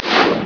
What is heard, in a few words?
jet fx; mk2